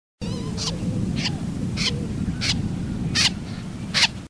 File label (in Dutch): Watersnip